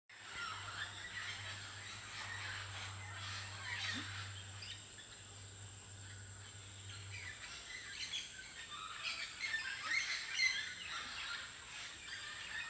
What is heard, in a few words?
mealy parrots; loras; San José